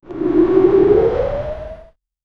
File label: increasing light whoosh, air